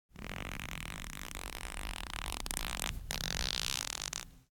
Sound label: cloth Stretch; spellbound